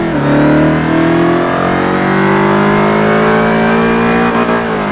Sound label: second, vehicles; gt500; TDMCarpack; TDMCars